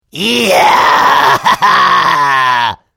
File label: yaaaah